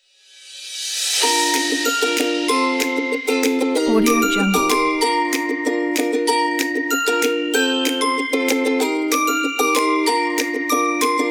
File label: fun kids music